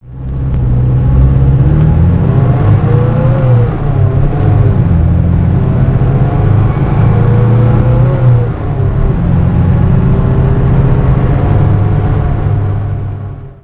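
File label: lancia flavia drive, Lancia Flavia Coupé; 1966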